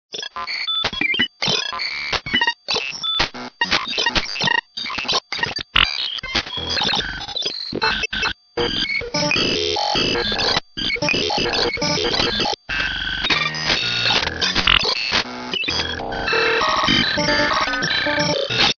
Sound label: Computer communication